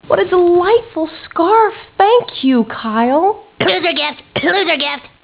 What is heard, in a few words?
cough